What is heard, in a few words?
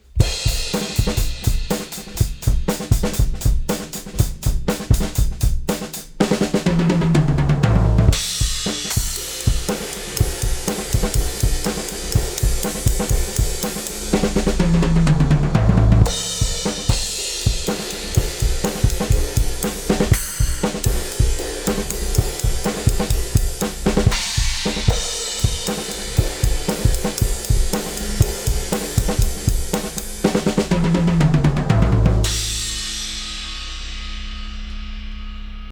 drums Drum Bus; Lindell 50 Series